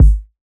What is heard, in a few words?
Kick; Ableton Live 12 Lite; Core Library; Drums